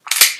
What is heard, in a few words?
rangedweapons reload; minetest